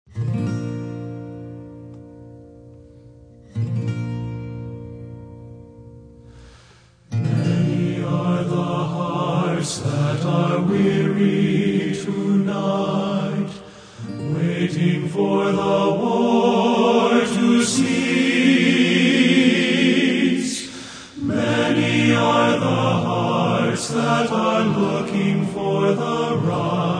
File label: Men's Chorus